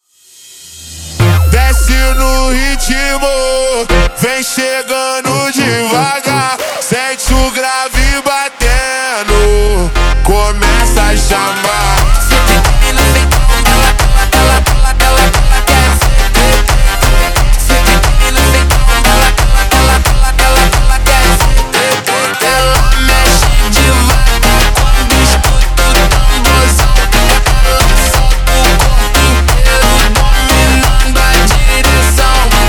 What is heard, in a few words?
Phonk, Slowed